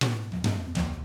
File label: FUNKY, Drums